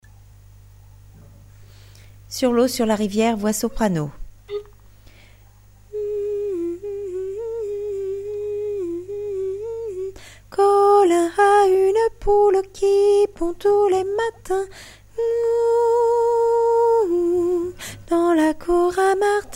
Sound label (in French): soprano